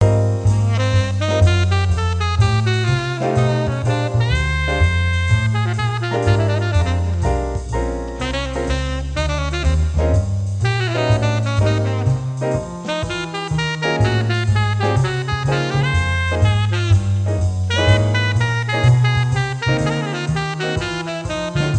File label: bar music